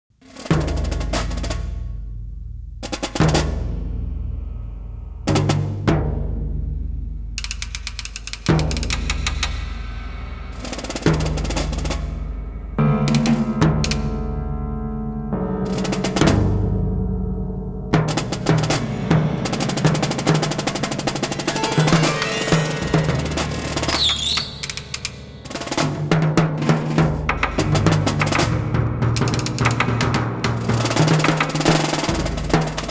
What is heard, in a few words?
Percussion Tracks